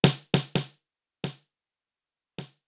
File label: snare conv